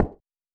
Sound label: High Heel